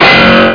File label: bwang